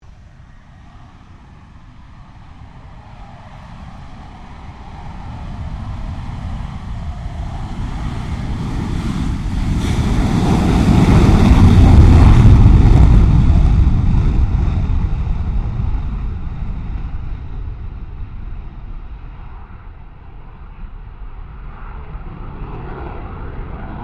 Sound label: Airoplane Jetfighter FA-18 Hornet Launch, Airshow; Avalon Airshow 2009; Airplanes; Vehicles